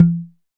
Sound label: PER Percussion